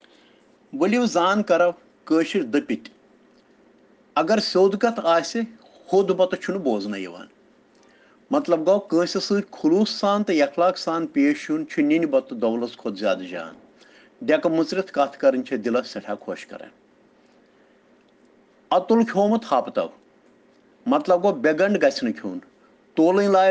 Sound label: Children Rhyms in Kashmiri